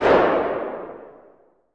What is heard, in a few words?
room